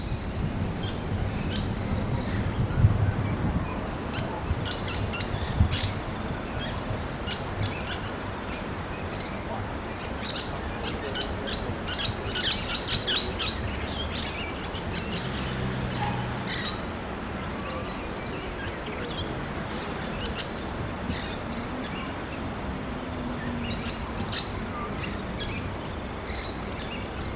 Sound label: lake around from bridge; Coburg Lake